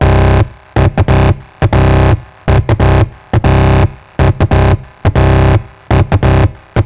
Trance Bassloop 140bpm, Bass Arps

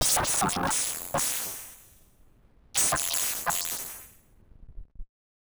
Malfunction Synth, malfunctioning synth samples

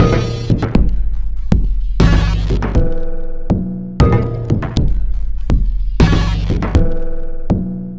Tonal Beat, Modular Electronica; Sonic Foundry (Sony Creative Software)

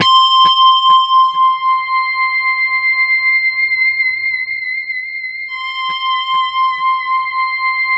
C5, Roland JV 2080 TECHNO PAD

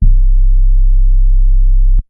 Sub, Synths BASS

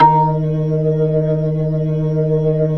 B-3, Jazz

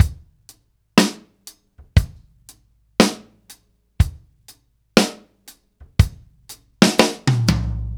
Ballad Loop, Drum, 70s Ballad; Drum Tools

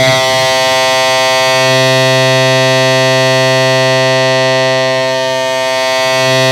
C3, Synth Line; 90 s Sample CDs; NiceBeats; SynthLine VOL-33

